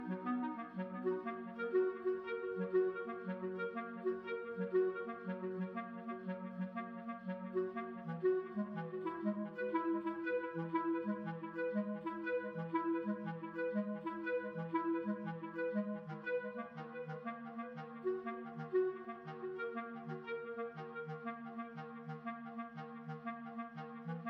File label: Space oboe